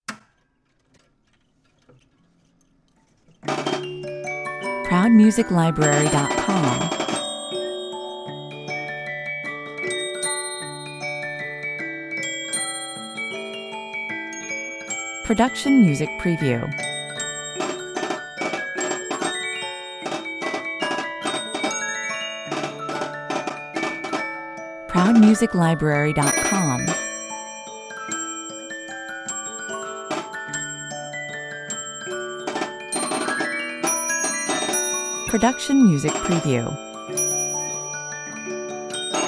toy soldier music box, Swiss Station Box with Drums and Bells; 1890 Swiss Station Box with Drums and Bells; Toy Soldier Music Box Theme